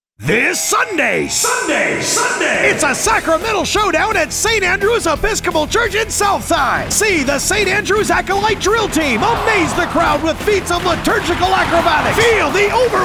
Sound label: Monster Truck